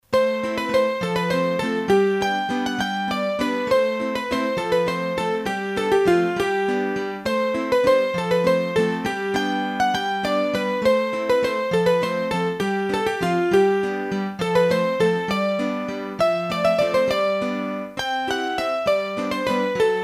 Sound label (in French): La Comete, hurdy gurdy, Bourrees a 3 Temp; Massif Central